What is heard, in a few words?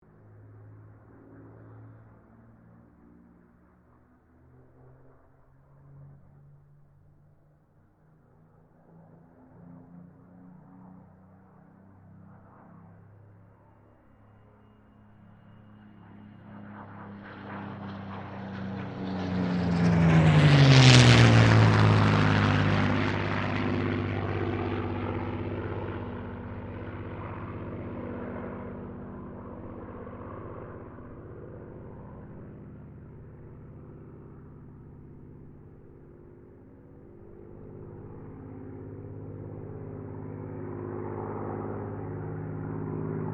AIRCRAFT SUPERMARINE SPITFIRE MK-XVI PASS